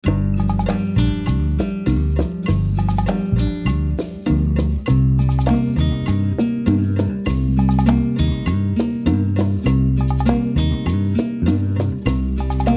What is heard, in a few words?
story opening